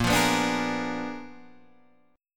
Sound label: A sharp 7th Flat 9th, Guitar Open D chord; down